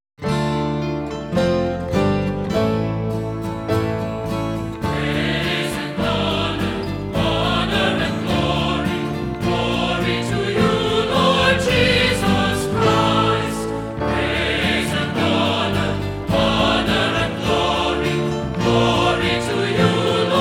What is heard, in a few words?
Lenten Gospel Acclamation Celtic Mass